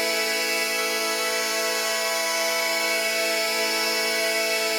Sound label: Pad Loop A, shimmer and sparkle